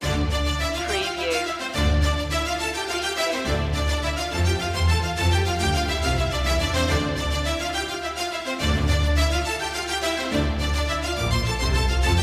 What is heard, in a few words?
the chase, oboe